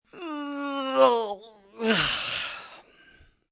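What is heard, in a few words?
Yawn Stretch Male, Yawn, male, stretch (human voice); Yawning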